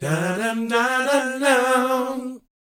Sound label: BOYZ G, Vocal Planet R&B & Dance; ILIO